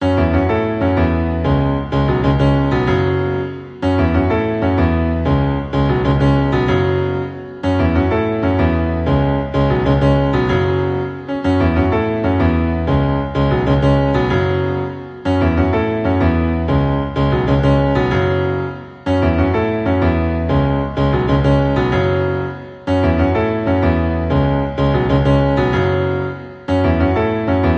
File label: Piano Cover